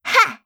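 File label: attack, Voice Line, Korean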